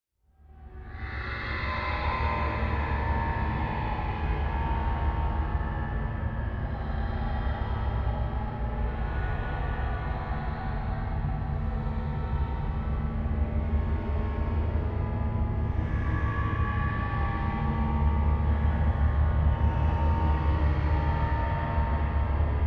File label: Drone Eerie Underworld Children Voices - Talking And Screams; Hollywood Edge; sound designer's toolkit v2